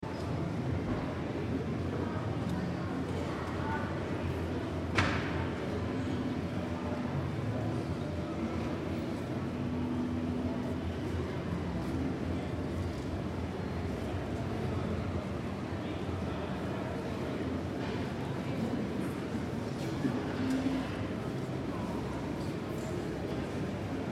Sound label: Train Station Large Hall, Calm ambience of a large train station hall; Unrecognizble language and general hum of voices; Occasional signal and announcemen